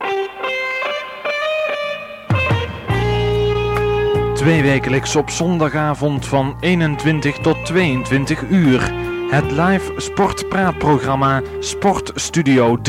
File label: Jingle; Sportstudio 3